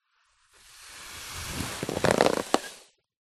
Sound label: foley plastic bag very thin blow up air from mouth; zapsplat